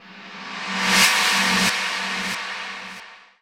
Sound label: TECHNO FX 140